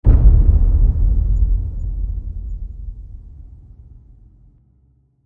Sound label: Deep Klung Hit Sub; Ninja Tracks